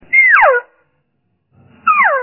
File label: cow chirp